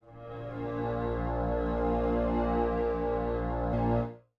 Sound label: String Ensemble A#1; Pro Sounds Sample Collection